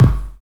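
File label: bass drum; E-Lab; X Static Goldmine 1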